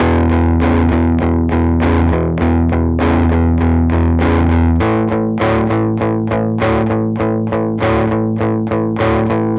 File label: 100 electric guitar loop straight blues Emaj